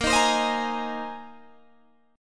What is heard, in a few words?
Bb 7th Suspended 2nd Suspended 4th Bb down Guitar Open G